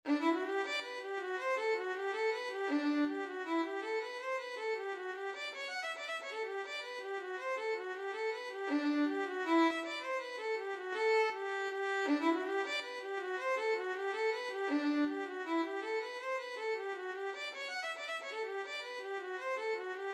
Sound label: hornpipe violin